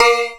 A#, Synclav FM PERCUSSION; Big Fish Audio

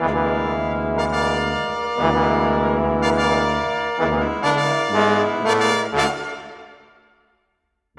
Brass, Orchestral, Rock Pop

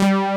FAT SYN, JV 1080; Pro-Rec - Synthsonic

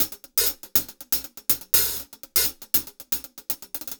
Top Ends; Zero-G; Monster Beats

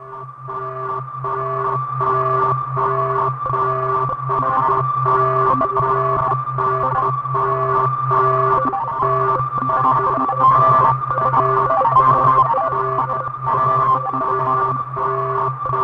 WARNING; Distored Reality

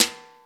BRUSHES, 12-Bit Drums

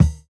Kick, jungle breaks drum sounds; ORIGINAL JUNGLE BREAKS; JBK KICKS

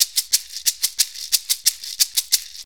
90 SHAKER

